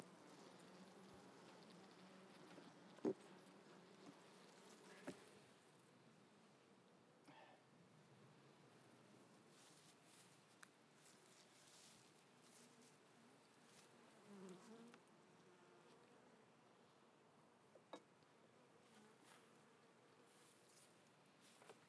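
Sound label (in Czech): vcela, bee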